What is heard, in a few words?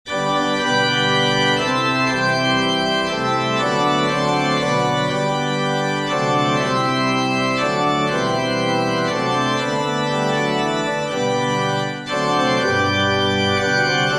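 orgel, organ; 't Was nacht in Bethlems dreven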